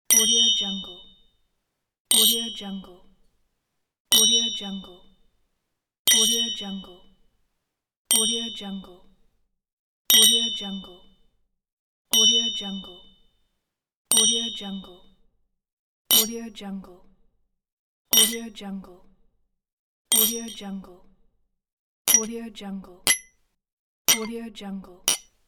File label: clink glasses pack